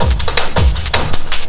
LOOP L, Drum & Bass 160 BPM

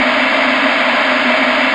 CRUNCH WIND C4; Big Fish Audio; Synth City

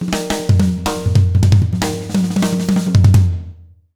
160 B BEAT, Jazz Latin Drumloops

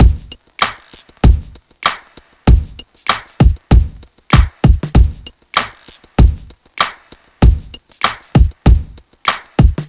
BEAT, Hip-Hop & R&B; USB Soundscan vol.47; AKAI

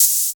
Main Room Open Hat; essential drumkit

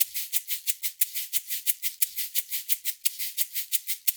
LOOP, SHAKERS; InVision Interactive; Percussion Slam

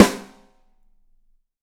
25 4X15 RO R, Drums, TUCSON KIT; Big Fish Audio; Ross Garfield Drums VOL-2

